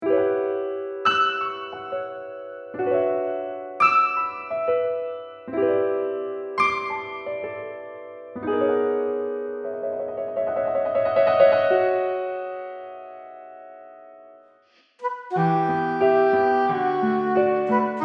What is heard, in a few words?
Nostalgia Duet with English Horn